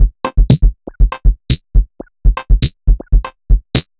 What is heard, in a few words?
Odd Glitch, 120 BPM, Percussion